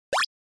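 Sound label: Game Boy General Pitch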